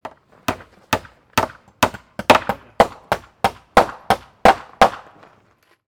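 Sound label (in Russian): стук забивания гвоздя молотком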